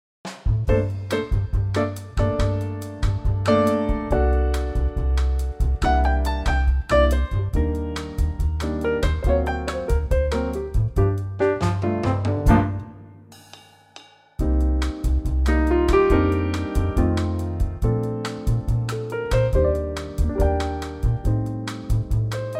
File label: bossa Gm trio